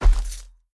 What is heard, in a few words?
Footsteps Dirt Walk; The Town